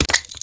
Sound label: ammo pu grenade; Spearhead Demo; weapons foley